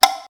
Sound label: Cowbell